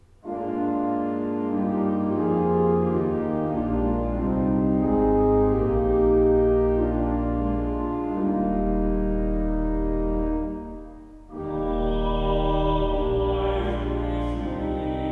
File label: Abide With Me with Pipe Organ